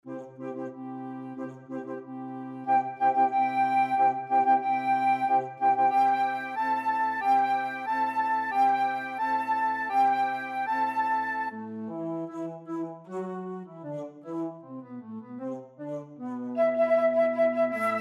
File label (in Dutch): fluit; Marche Royale de Lion; Carnaval des Animaux; Camille Saint-Saëns